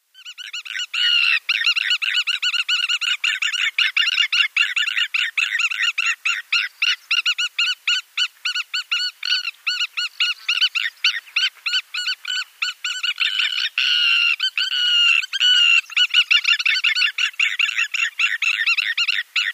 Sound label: Spur-winged Plover pair display